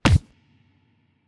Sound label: Punch Boxing Reverb